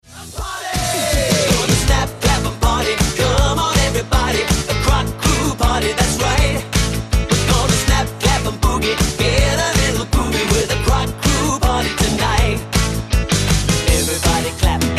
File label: Snap Clap and Party